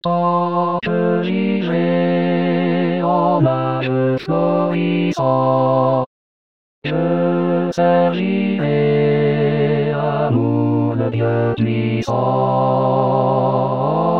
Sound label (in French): basse; rimailleurs